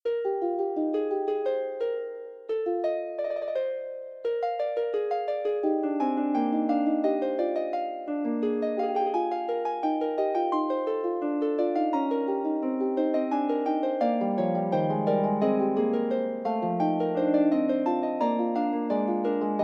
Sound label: Fugue, Harp